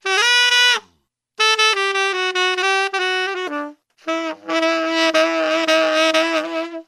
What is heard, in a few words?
sax gospoid, loops, phonky